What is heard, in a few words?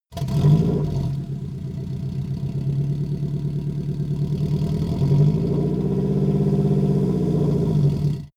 car vw t1 start engine start drive